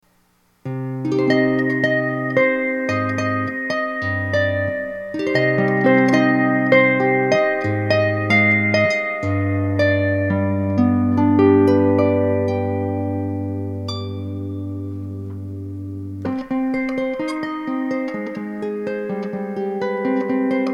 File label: Electric Harp